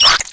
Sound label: Rat Attack; Playable Tutorial Level; TR1